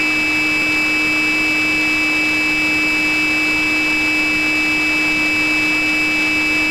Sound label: Narrow AM; gqrx